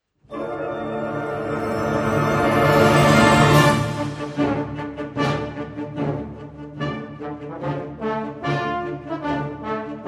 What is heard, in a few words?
Episode for Fanfare, fanfare band